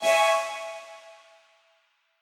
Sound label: Quasar Clear B